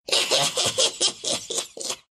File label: laugh, ru; Dota 2